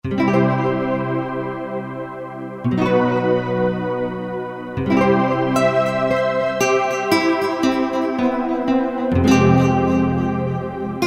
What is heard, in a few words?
Nylon Story, Roland JD-990; Factory Patches